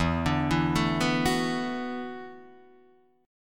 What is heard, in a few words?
E 7th Suspended 2nd Suspended 4th, Guitar, DADGAD; chord shape